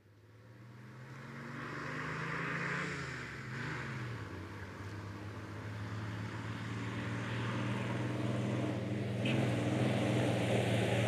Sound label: Dodge Power Wagon Fire Truck, In Medium Speed, Stop On Gravel Cu, Rev, Away Medium Speed with Revs, Off.